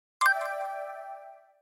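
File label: Email, phone notification tone; Nokia; 6650 fold AT&T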